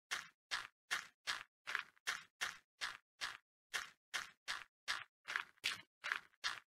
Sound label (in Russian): shagi po griazi; minecraft